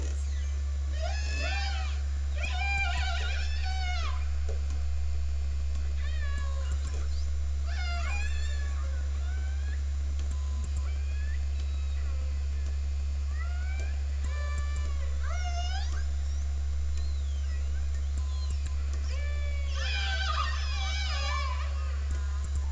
Nocturnal Vocalization episode

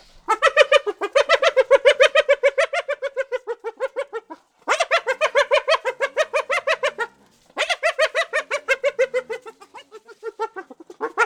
hyena laugh

